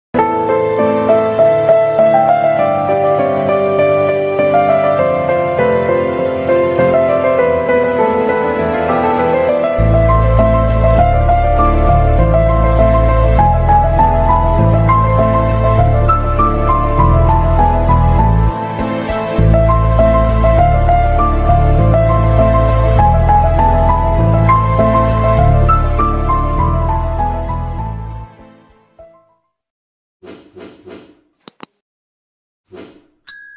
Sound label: love bgm ringtone tamil ringtone